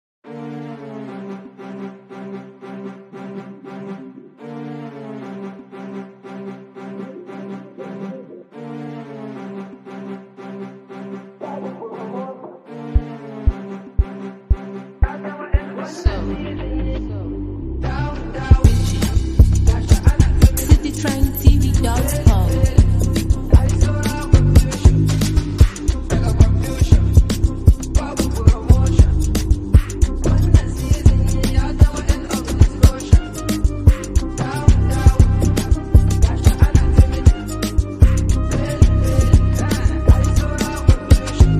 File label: Hausa piano